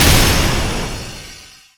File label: Enemy Highcactas Active Hit; disney star smash android ios mobile gamerip 2020